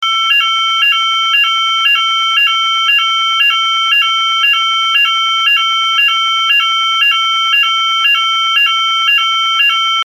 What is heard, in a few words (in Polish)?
ton